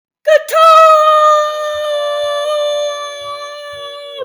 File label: guitar